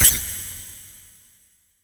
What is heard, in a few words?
SHORT, SHORTNOIS